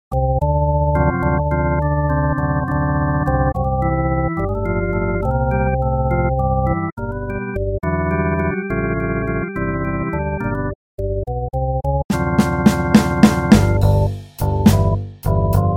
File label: vox continental combo organ